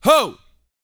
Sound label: VOCAL F; ILIO